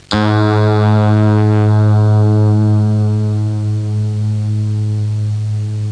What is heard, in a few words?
string twang; cartoon sfx; Sound Effects for Multimedia - Volume 1